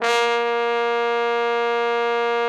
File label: TROMBONE, SECTION LOOP; Best Service; Killer Horns